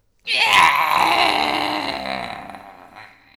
Voices death

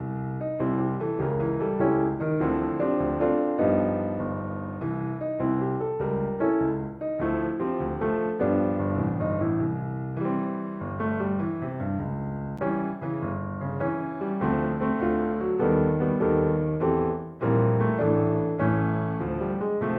Loop

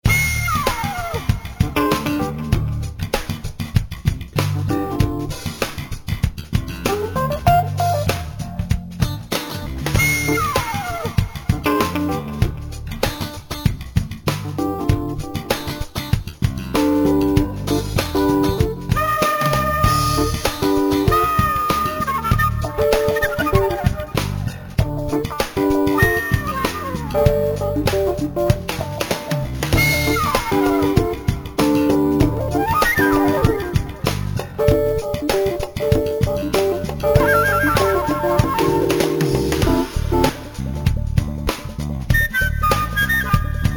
Random Level; Sheep Raiders

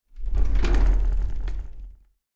Rumble Desk

